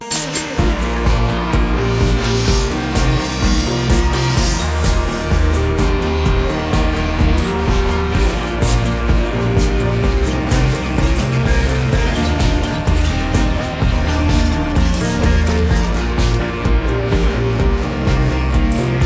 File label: instrumental